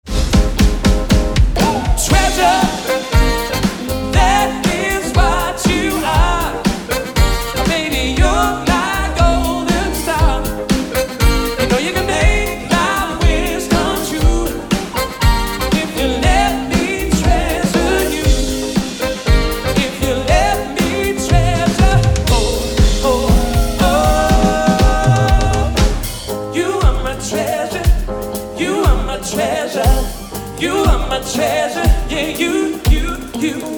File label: Soul Band; Surrey